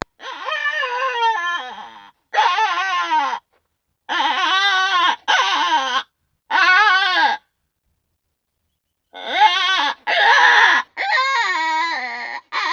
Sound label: Predator Roedeer; Nordik